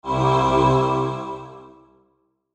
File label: Logo Synth Tonal Swell; Sonic Logo; Blastwave